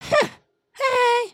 raincloud

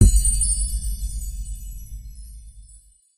start chimes

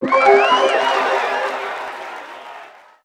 MINIGAME SUCCESS

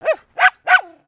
Pet Dog Small Happy; creatures; pets; Lego

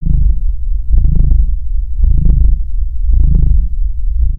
Robot Sleep; 1shot; creatures mechanical; Lego

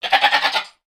Pets Goat Happy; creatures; Lego

